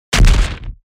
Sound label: SMACK